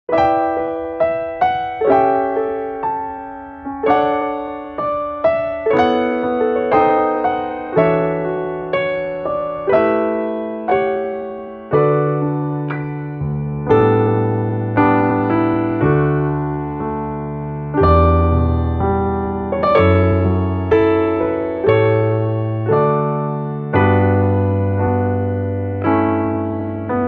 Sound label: ACCOMP D